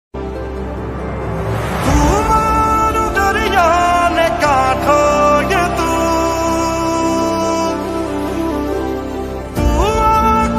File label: movie song ringtone